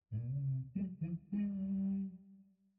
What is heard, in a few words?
Singing; Mountain SFX